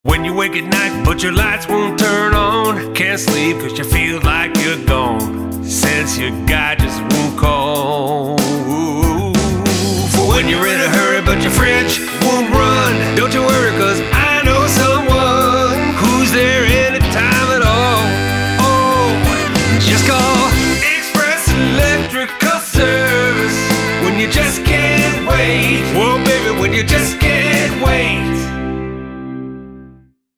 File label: electrical jingle